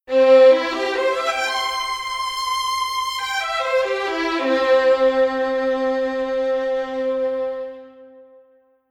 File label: Violin